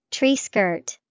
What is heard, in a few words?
tree skirt